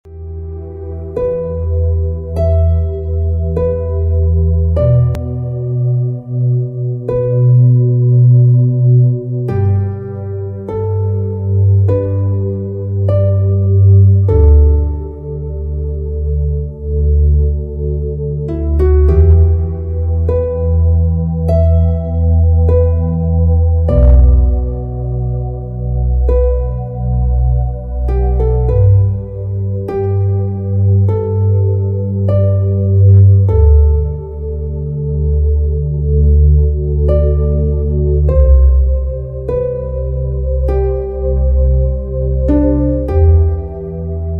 Vibes Of The Harp

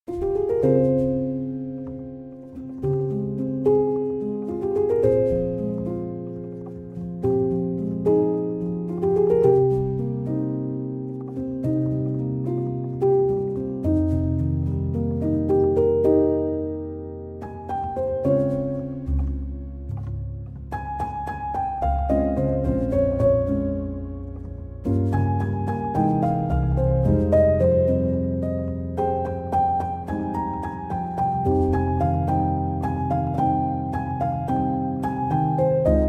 piano instrumental

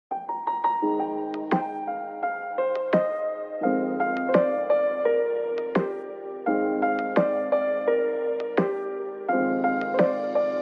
vibey lofi chill instrumental; from black panther

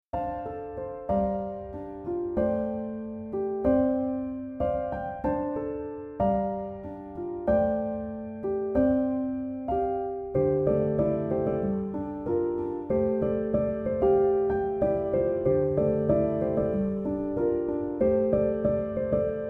piano instrumental

